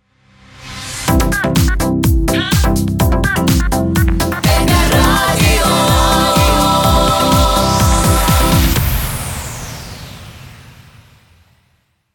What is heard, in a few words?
jingle 125bpm